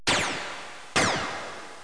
PHOTON, Misc; 2000 Sound Effects

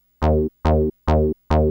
Bas lon; Techno Music Maker; Bass

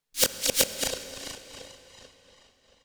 FX, ambient, 160; eJay

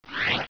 Mailbox; FirstClass; VillageSettings 3.80

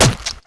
Stab; Shadowman; weapons; baton

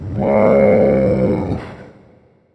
maia, maiasaur; oldmaia1

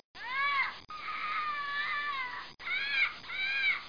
PARROT; 10,000 Graphics Pack Volume 1; Media Graphics International

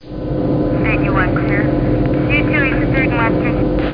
radio; BigMax nº 1

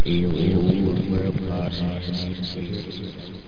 DEATH

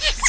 squeak

